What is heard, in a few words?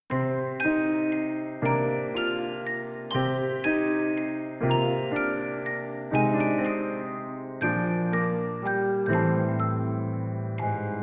Scene Game Over